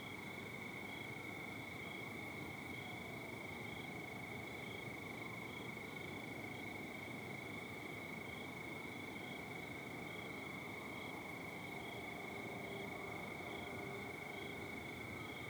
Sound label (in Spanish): Ambiente Paris noche; Yesterday Origins